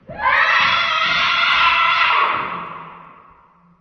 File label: screamin base